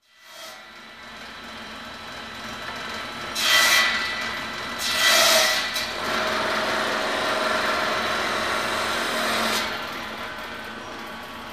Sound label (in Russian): derevoobrabativayushij ceh cirkulyarnaya pila